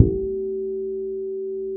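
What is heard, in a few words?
G 4, fretless harmonics, bass; Spectrasonics; Bass Legends CD 1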